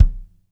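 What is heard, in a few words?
bass drum, Drum 1 Dry; East Collexion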